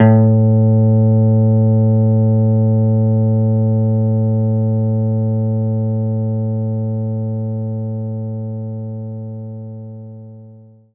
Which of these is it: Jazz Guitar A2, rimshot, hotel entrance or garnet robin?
Jazz Guitar A2